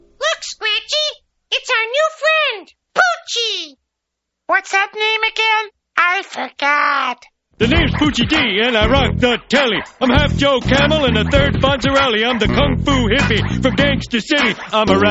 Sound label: rap song; poochie